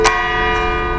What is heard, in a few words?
no crying